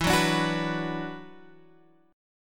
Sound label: E 7th Suspended 2nd, chord; E7sus2 Chord {X 7 4 7 7 7} strummed